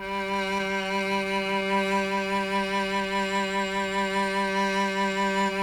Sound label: CHAMB CELLO G#3; Sonic Reality; Vintage Timetraveler